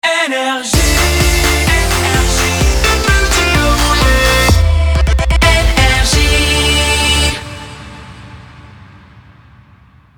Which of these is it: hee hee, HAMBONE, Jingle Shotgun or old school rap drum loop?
Jingle Shotgun